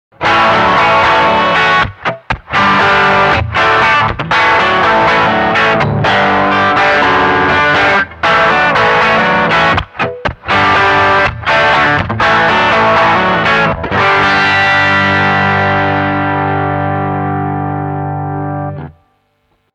Heavy Crunch

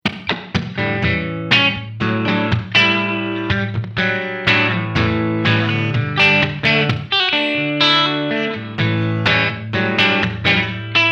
Clean

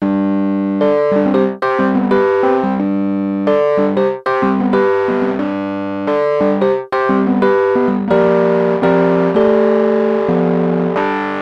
Elektron Analog Heat, Round Fuzz Rhodes

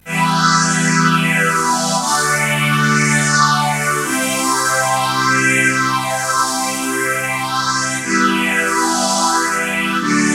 Vintage String Ensemble, EHX Bad Stone

